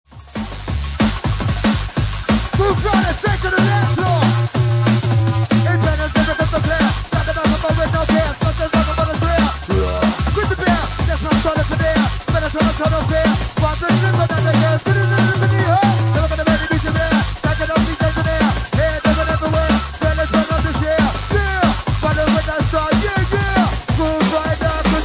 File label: DNB